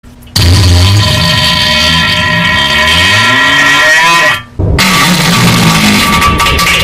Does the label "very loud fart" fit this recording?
Yes